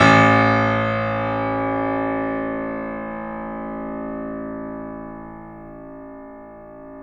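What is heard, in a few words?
piano, right; InVision Interactive; The Piano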